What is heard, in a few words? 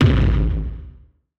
Impact; portal quest; android; Paladin Skill2